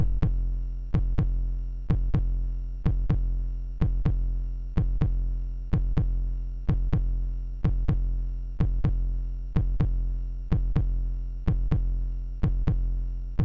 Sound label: Race, sid, MOS6581R3